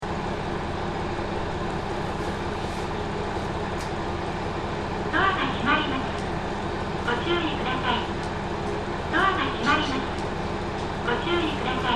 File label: jr kiha 220, train motor sound